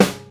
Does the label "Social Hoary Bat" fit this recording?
No